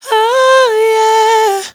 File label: riff oh yeah Dm, Dry Vocals; Raw Vocals; Slate Digital Omnivox Vocal Sample Pack